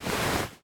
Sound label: clothes rustling